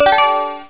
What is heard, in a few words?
INTR BELL